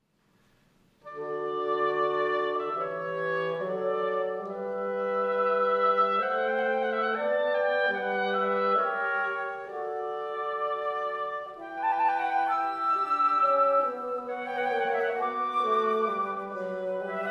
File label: Quintet in D Major, Andante; Allegro Spiritoso, Wind Quintet